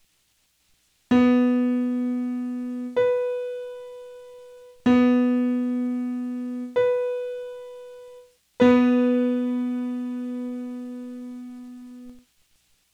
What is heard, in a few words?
Not Octaves, Pitch; Music by the Numbers